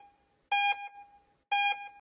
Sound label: Mine Sound